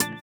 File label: VIBRA; Spectrasonics; Metamorphosis